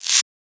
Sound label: Shaker